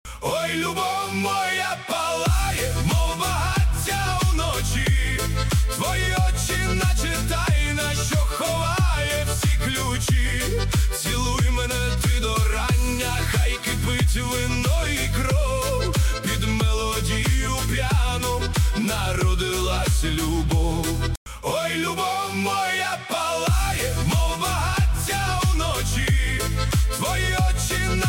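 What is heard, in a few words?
пьяная мелодия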